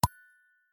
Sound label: pop